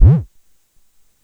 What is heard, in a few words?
WOO, Dual Percussion Synthesiser; Klone